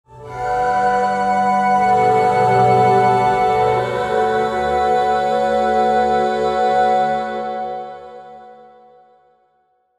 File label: Logo Vocal Airy Reverb; Sonic Logo; Blastwave